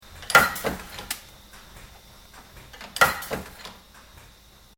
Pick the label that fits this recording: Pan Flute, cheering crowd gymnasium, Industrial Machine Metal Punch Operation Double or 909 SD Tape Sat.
Industrial Machine Metal Punch Operation Double